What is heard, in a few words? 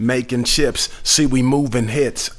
Rap Vox